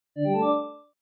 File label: alarm; pesterchum; crosbytop